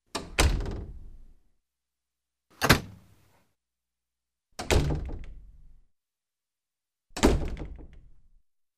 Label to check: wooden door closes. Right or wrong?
Right